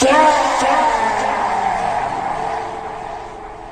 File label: fahh slowed